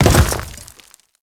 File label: Grineer Step Dirt, Footsteps; Warframe